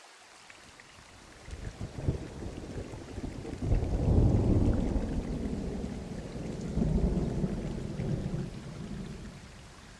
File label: storm; firewatch